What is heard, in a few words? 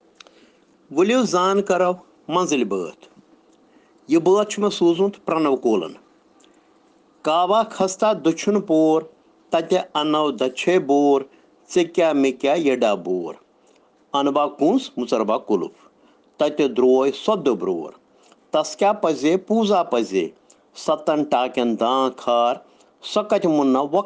Children Rhyms in Kashmiri